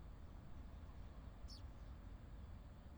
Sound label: richards pipit loud; night flight calls in beijing